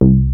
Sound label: BASS C3, Hip Hop; E-MU Formula 4000 Series Vol. 1; Hip Hop Nation